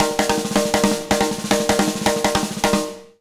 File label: 160 B BEAT, Jazz Latin Drumloops